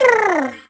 dismounts; Yoshi; Mario; Super Mario Sunshine

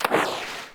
Fish Jump Into Water; New Super Mario Bros. Wii

